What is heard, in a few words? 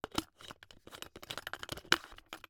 coffee, grinder, manual, ceramic, glass, silicone, attach jar, screw on; Eiravaein Works